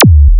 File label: Chunk, Drums, Industrial; Sonic Foundry (Sony Creative Software)